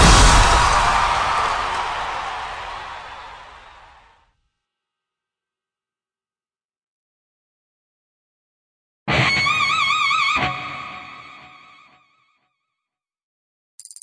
win Presentation; netent

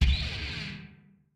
quick Stop; netent

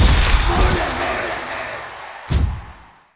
medium win; netent

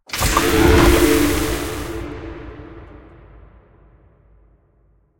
bonus Game Start; netent; bloodsuckers2

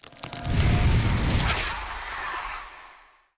free Spin Animation; netent; dracula

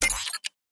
free Spin Countdown; netent; whenpigsfly

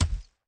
symbol Stop On Ground; netent; eldorado

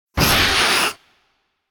scream big; netent; dracula

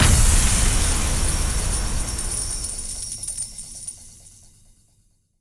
big Win Start; netent; luckyangler

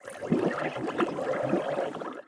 scatter Animation; netent; luckyangler

